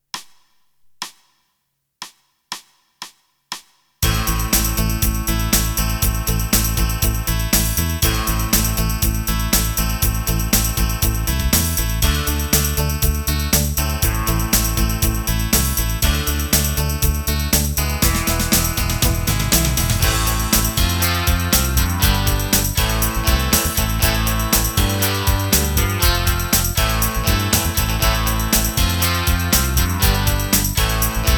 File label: pop rock; Pop Rock 1